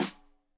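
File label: Snare Stereo, Drums; Deeply Sampled Drums; SMDrum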